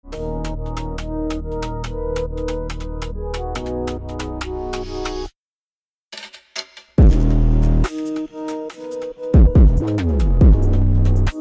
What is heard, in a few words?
Bass pressure bass boosted moment